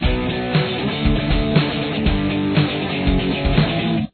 chorus guitar, playing the chord progression with power chords; drop D tuning; The chorus has two guitar parts.; Guitar 1